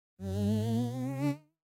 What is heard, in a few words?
Object Crate Opening Start Up Sweetener